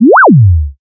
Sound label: Cartoon Jump